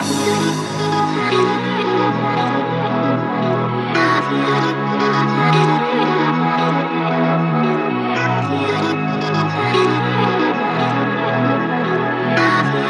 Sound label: electro dance